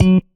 marimba; ゆめ2っき

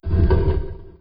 sfx stone short

